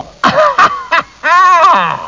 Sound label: HAHAHA